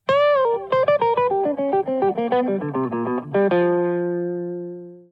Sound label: Riff